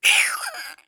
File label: Monster